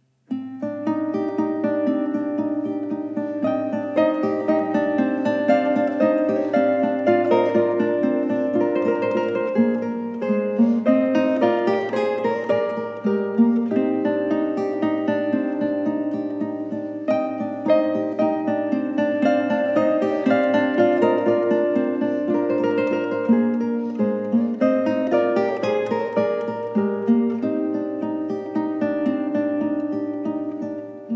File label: Oriental